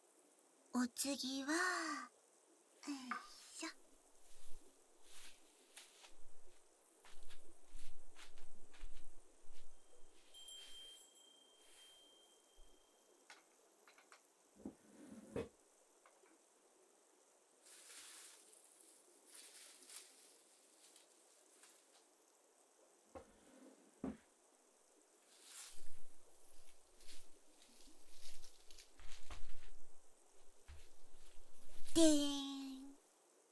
ヨモギ耳マッサージ

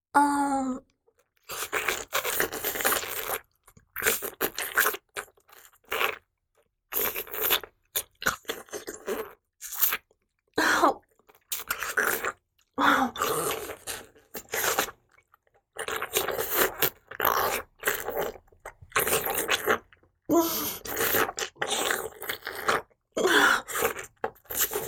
フェラチオ(中):亀頭をしゃぶる, チュパ音

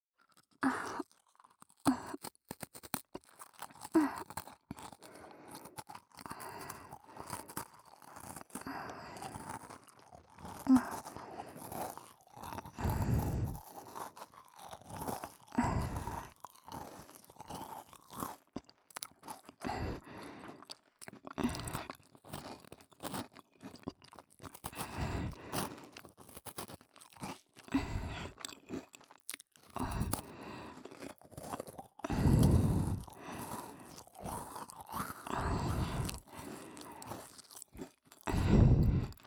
ASMR クッキー; えろぺろ

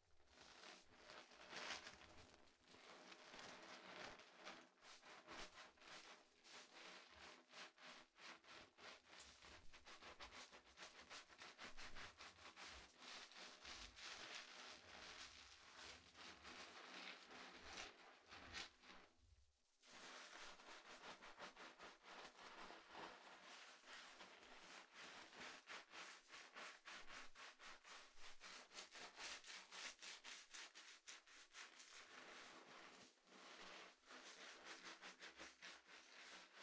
自分 体 を 洗う, お風呂に関する音; 3DIO

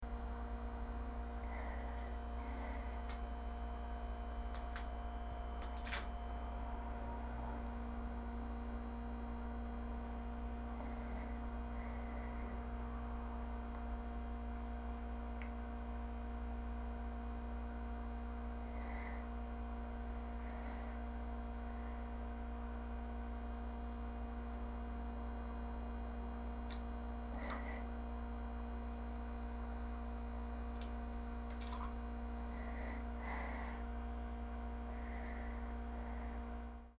大、吐息とポチャ音

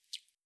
しぶき 短; ぴゅっ 短め

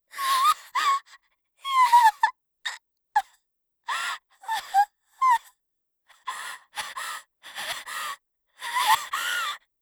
目を見開く

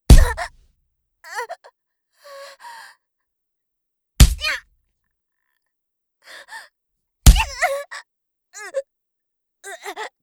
腹パン

